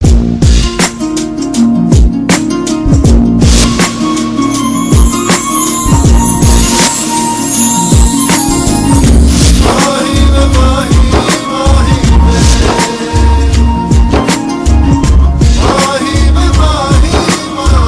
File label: Loud Tone